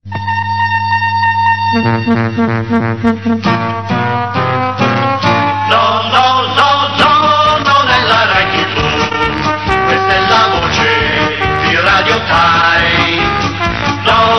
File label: jingle; radiotime; 1986